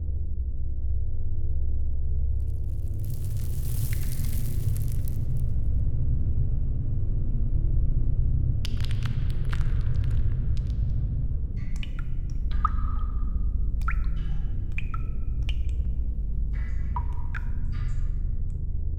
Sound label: indoor amb cave